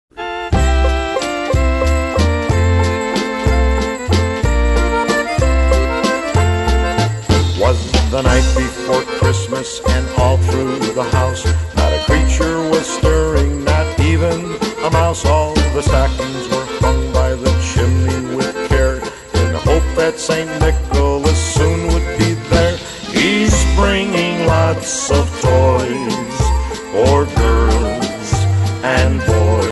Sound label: Christmas Waltz